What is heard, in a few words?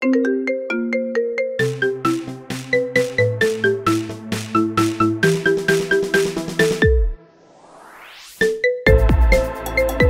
Marimba Remix